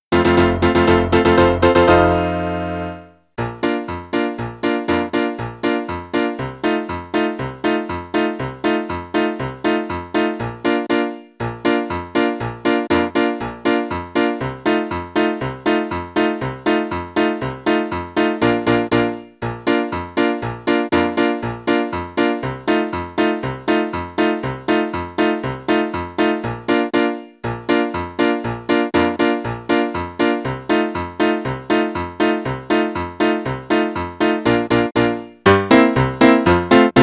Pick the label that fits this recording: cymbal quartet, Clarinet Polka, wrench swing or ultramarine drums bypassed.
Clarinet Polka